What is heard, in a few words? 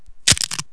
bone break, carrots and celery; Very fresh carrots and celery